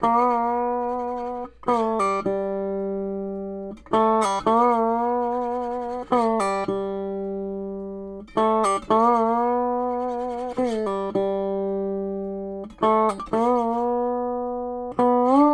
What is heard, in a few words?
Country Dobro Bridge; Digidesign; Pro Tools; Pro Tools Essential Demos; Essential Loop Demos; Demo Session - Country